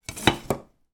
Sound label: household large kitchen knife put in wooden block